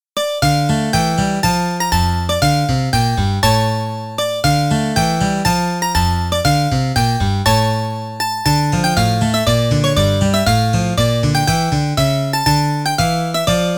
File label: The running Boore; Musicks hand maid